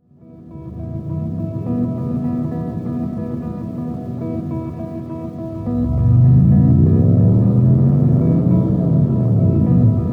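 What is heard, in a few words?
guitar electronics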